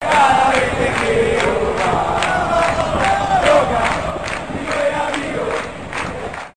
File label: supporters, football supporters